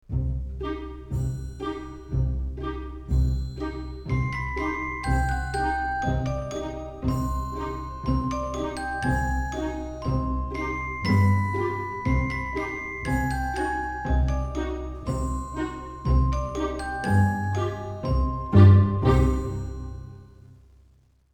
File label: Romance Dance; Elephinalla